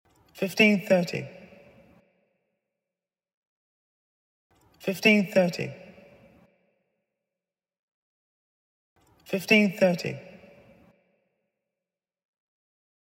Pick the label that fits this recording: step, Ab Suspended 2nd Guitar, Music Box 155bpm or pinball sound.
Music Box 155bpm